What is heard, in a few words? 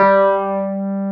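Wurlitzer G3 - R; USB Soundscan vol.09; AKAI; Keyboards Old School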